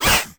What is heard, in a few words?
vampbat attack; Crypt of the NecroDancer